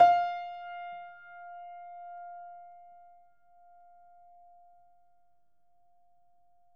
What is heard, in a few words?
piano ff, steinway